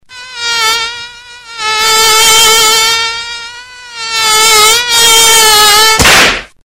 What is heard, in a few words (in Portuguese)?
mosquito chato; toques para celular